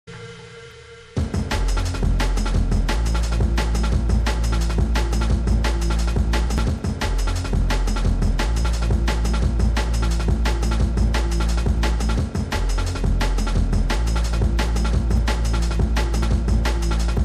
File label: Drum and Bass Jungle and Old Skool, UK Bass Radio